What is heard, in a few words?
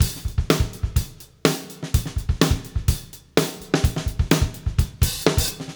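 125 bpm, beat loops; Zero-G; Monster Beats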